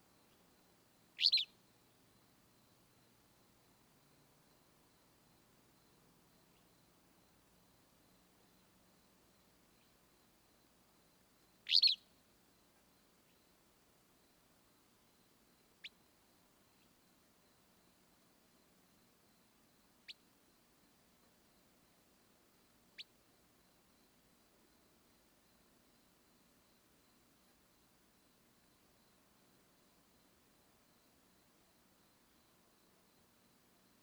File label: Willow Flycatcher